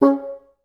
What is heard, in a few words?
BNS2STAC C#3, Advanced Orchestra; Best Service; Peter Siedlaczek's Advanced Orchestra